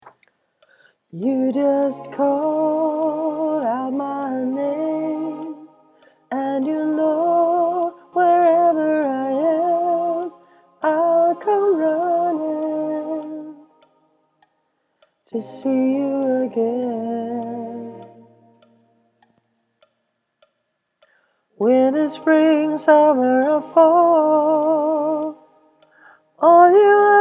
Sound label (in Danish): ALT 2